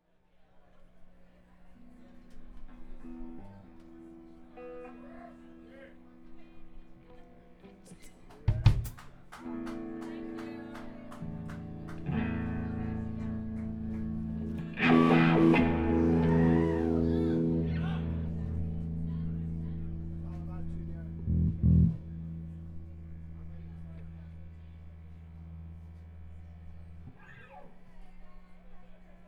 tuning

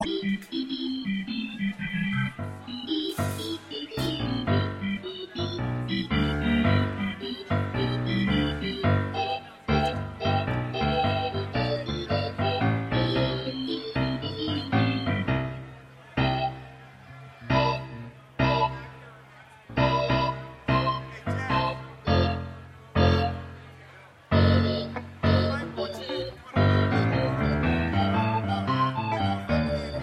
tuning

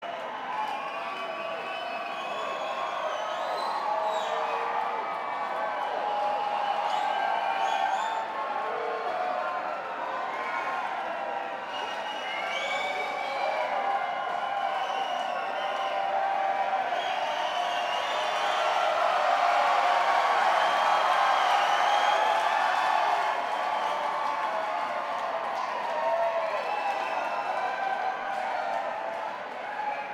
Crowd Banter

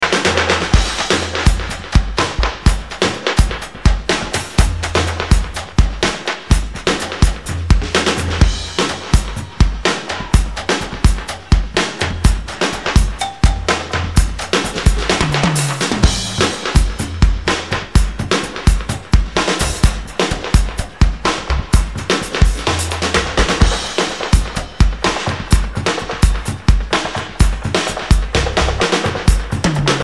Drum Dub

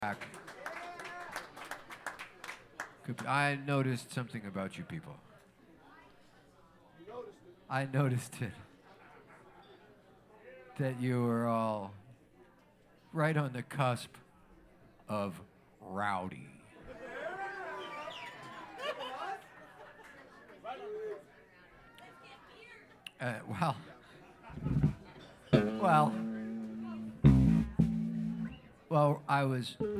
tuning

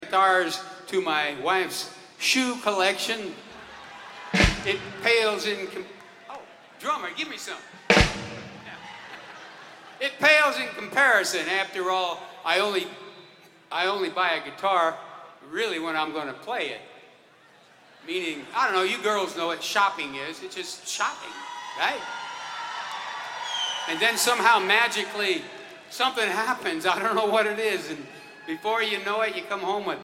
Guitar Talk

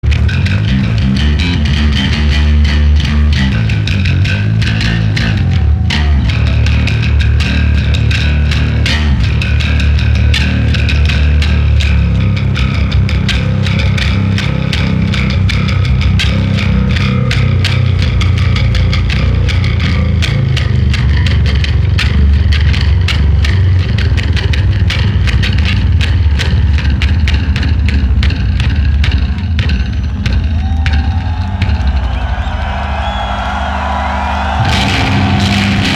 Bass Solo

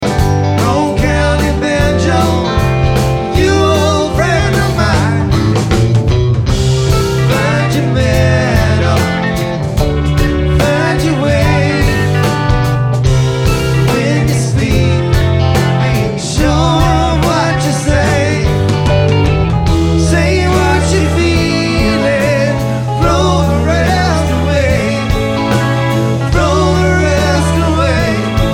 Banjo

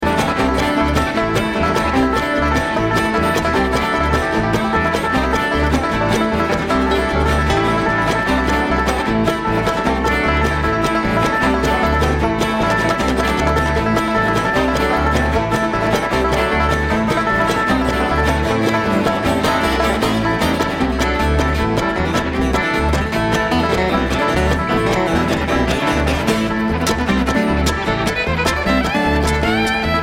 Traffic Jam

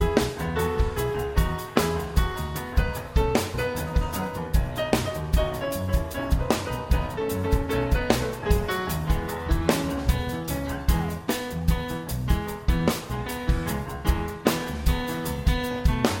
instrumental

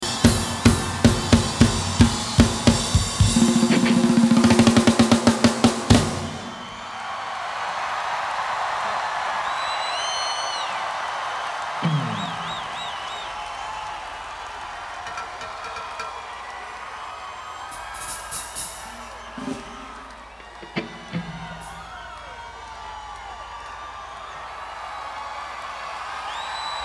Drum Solo

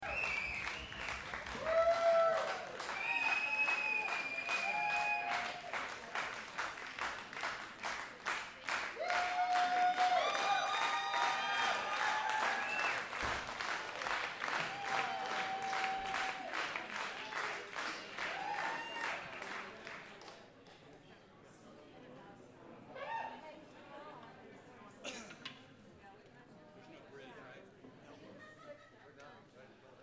encore applause

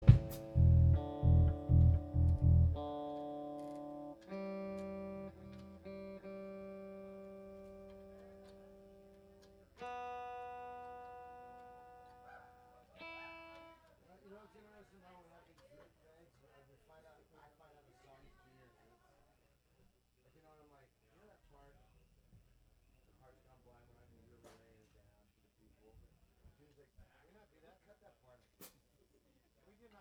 tuning intro

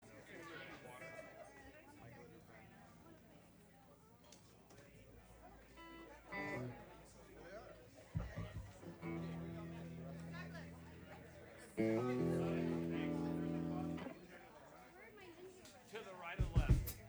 intro tuning

